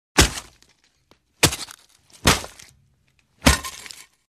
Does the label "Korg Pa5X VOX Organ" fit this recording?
No